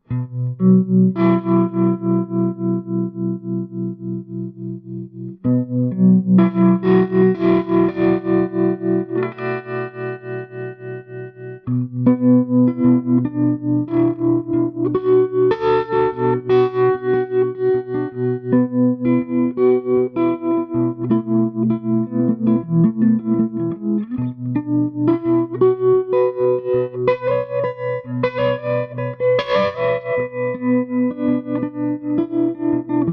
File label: Hamer Clean Trem Neck; Swart 30 EL34; super 30